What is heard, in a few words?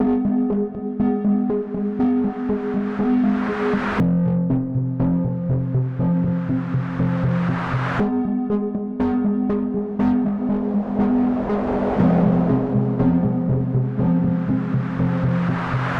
Cinematic Background